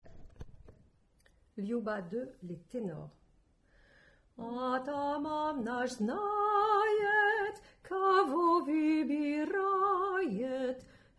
Tenor

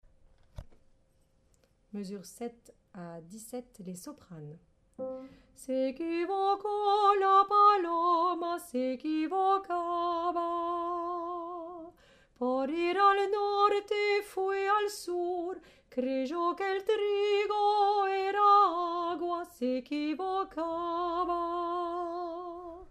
paloma Soprano

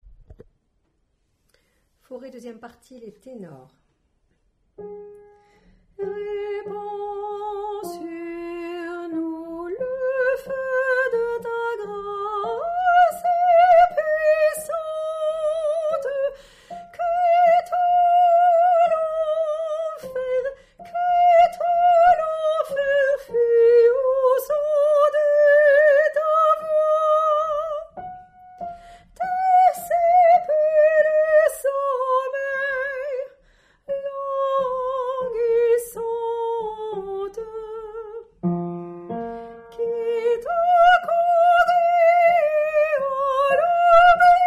Tenor

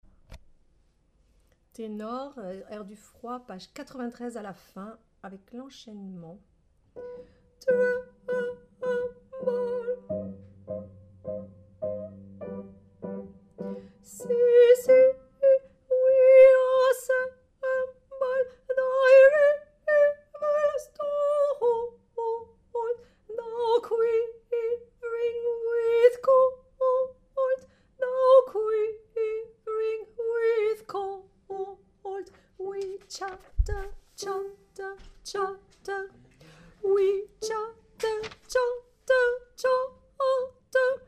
Tenor

